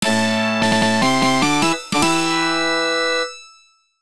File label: Fanfare; Burning Point; PC-88